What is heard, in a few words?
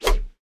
Badminton Racket; Wieldables